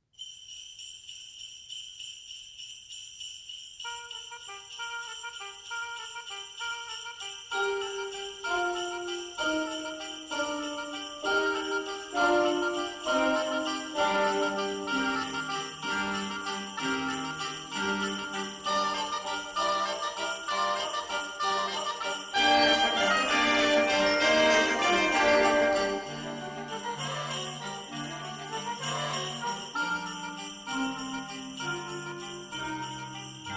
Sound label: Carol Of The Bells orchestral